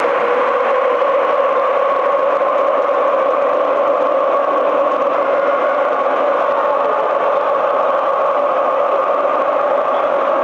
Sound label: ooooh blood ooooh and ooooh gold, chants